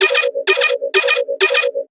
Win

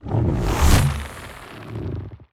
Puffer

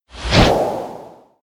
Shooter Lift Off

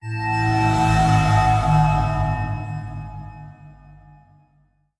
Blue Lights Off; moul-assets

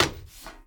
Stone Turn; moul

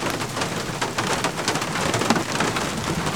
Rain Loop

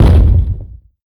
Ball End Impact

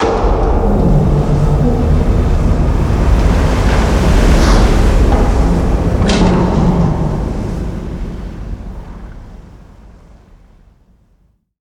Ocean Gate Down

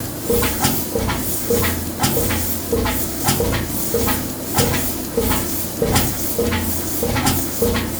Harvester Loop

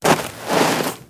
Dirt Turn

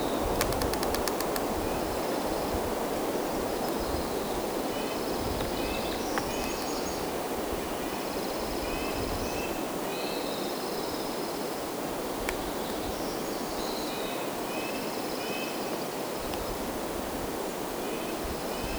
Amb

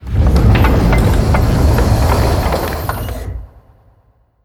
Cave Door Open

